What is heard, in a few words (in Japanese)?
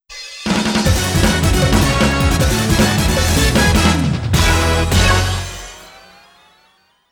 戦闘勝利ジングル; Virgin Fleet